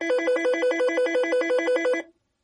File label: nortel vista ring